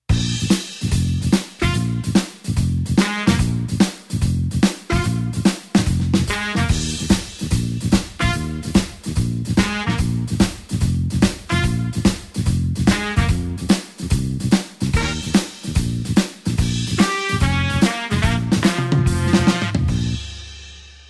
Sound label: blues horns shuffle Bb